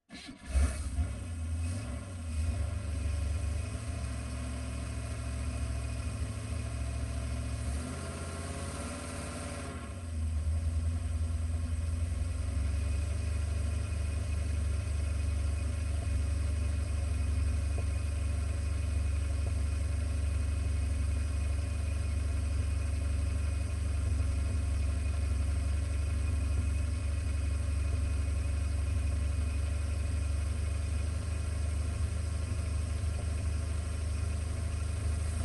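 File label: drag boat onboard medium drive various engine intake; dpa4062; sonniss gdc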